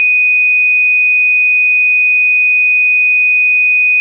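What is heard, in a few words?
Clean Sine DX100 D#0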